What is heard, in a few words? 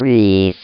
breath; wow